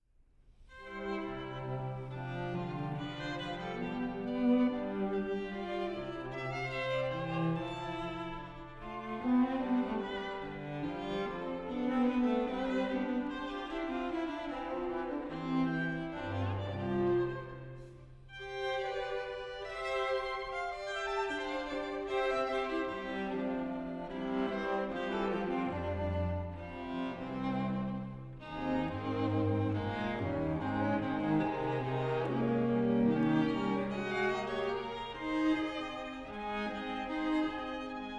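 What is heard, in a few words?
String Trio; Wheelock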